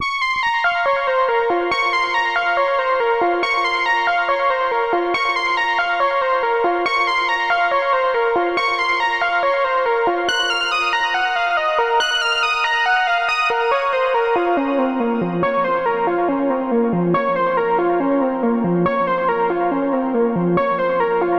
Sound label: 140 BPM A# Min Lead, Melody Loops, Synth Collection; Cymatics; ANALOG EVOLUTION Beta